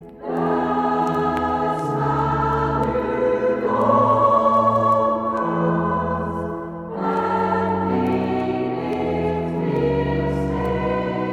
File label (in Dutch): Koor dames; Schevenings vissersvrouwen